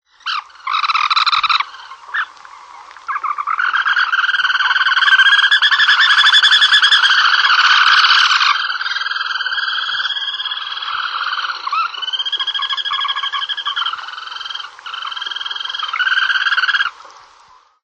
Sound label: Black Turnstone; Birds